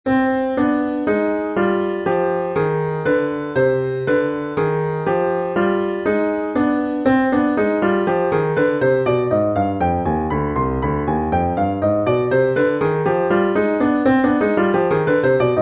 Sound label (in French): Gamme do mineur mvt contraires